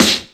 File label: SD, Dance Drums